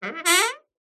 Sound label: household door wooden creak short